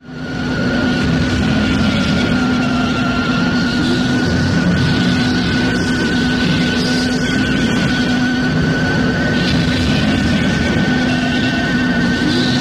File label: Shortwave; Ritual